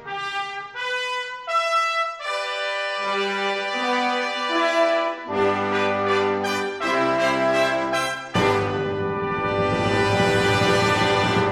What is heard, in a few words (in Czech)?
Slavnostni fanfara pro cyklisty